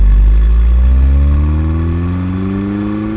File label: porsche, 汽车; 交通